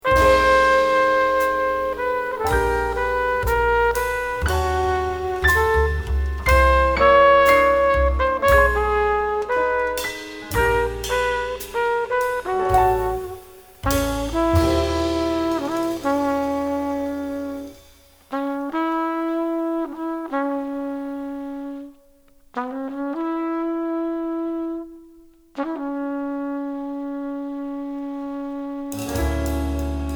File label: trumpet